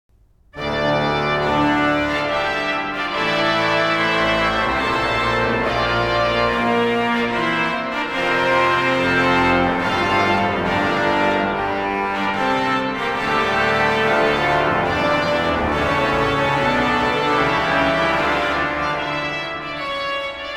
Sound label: grand jeu, organ